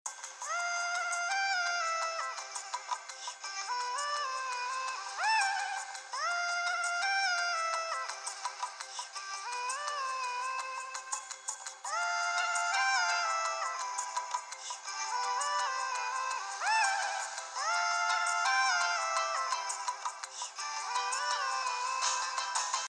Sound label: Chorus